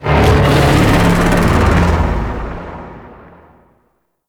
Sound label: Void Dragon Vocal Ability; Enemies; Vocals; Warframe; Duviri